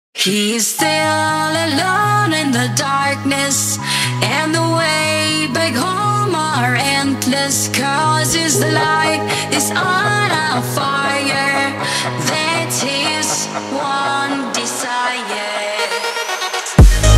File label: Phonk